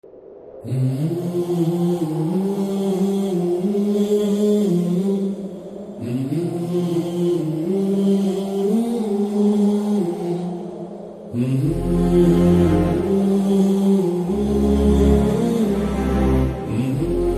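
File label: background Humming Telugu Ringtone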